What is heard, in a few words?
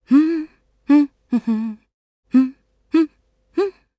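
Vox Hum Japanese; Glenwys; kingsraid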